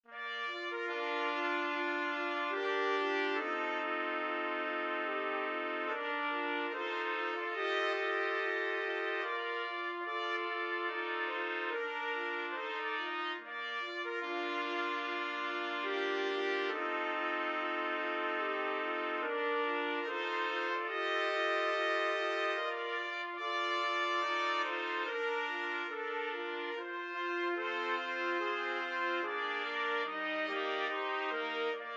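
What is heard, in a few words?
4 trumpets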